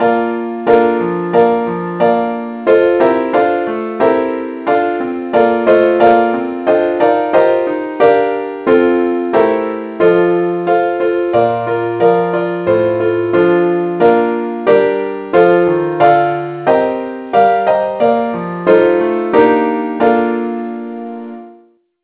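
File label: keyboard key B flat; saintthomas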